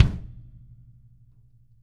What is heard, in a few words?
kick, Interactive Drum Kits; Sonic Reality